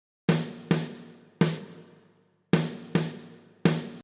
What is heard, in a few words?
snare comp; masaakiwada